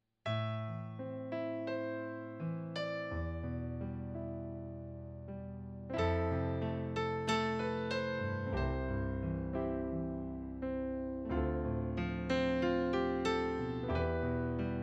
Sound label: Triton Taktile APiano